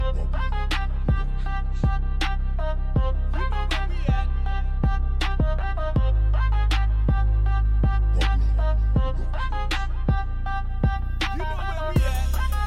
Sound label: Reggae Dancehall